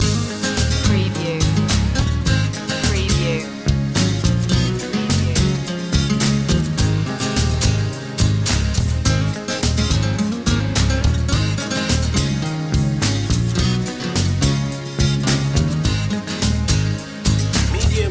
guitar slinger loop

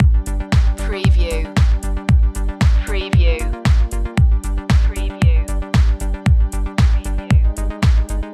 purple dream loop

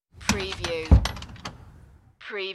Shut Front Door

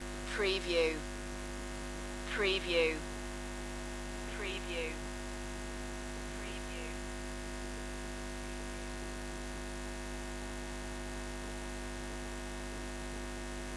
electric hum

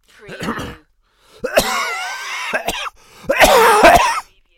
Male Cough; beanstalk audio

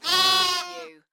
Lamb bleating; beanstalk audio

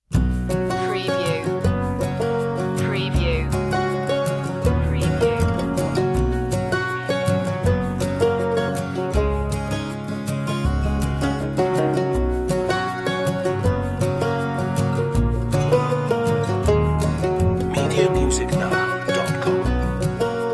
blue grass mellow

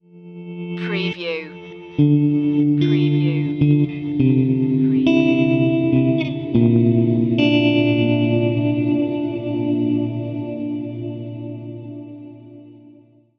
slow down stinger

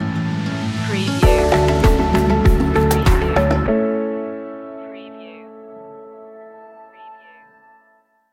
natural tendencies stinger

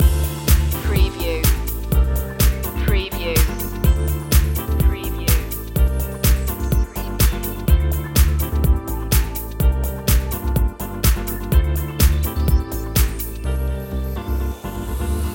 lightly grooved loop

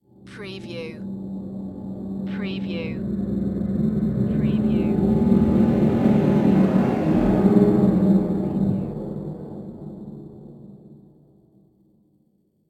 Reverse Ghost Whoosh

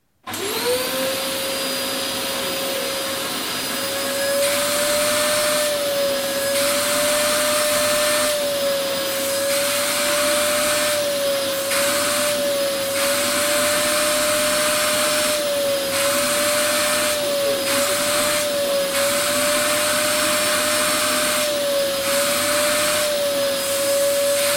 Hoovering Vacuum Cleaner

